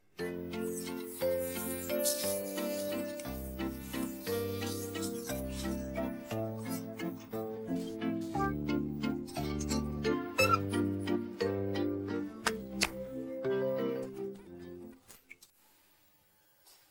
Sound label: Skating Waltz; pingu the original soundtrack